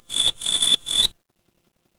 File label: animal insect